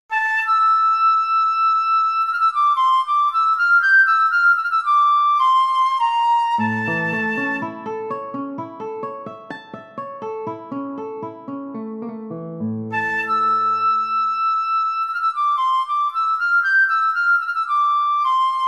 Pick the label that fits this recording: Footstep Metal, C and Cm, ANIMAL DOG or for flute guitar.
for flute guitar